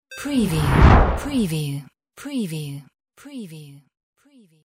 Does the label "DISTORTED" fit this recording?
Yes